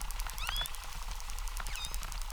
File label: Chirp, acquarium